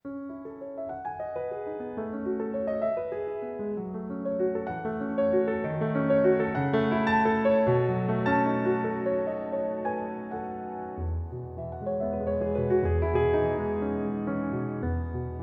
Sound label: Arabesque No. 1, Piano; 2 Arabesques